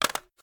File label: ar reload dmr mag in; weapons; gmod; viper; scharlie